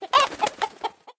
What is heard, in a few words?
Rooster Attack